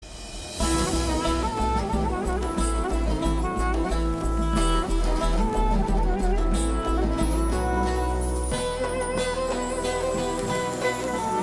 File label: Love BGM; Sufiyum Sujatayum